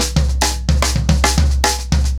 110BPM, SNARE TOM SOLOS

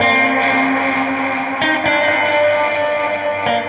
Strat Dub 130 C, Guitar Dub, 130bpm; musicradar; dub designer

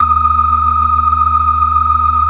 FAST VIB, ORGAN, TREMOLO VIB

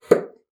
BASSOON; Xsample VOL-6

